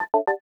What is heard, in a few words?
Link Message, Notifications; Rakuten Mobile; Rakuten Link sounds